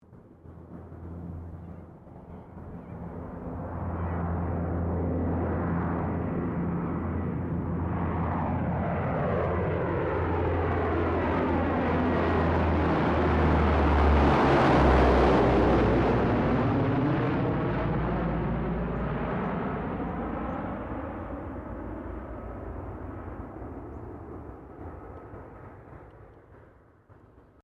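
C130 Hercules pass, Lockheed C130 Hurcules, Airplane; Airplanes (Sound Effects:Vehicles)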